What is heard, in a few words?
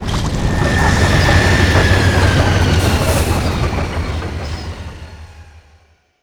scuba sub stopping power down; ocean-commotion